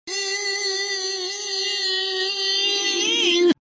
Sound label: reeeeee; reverse